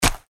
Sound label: Block Damage SFX; Gut Eater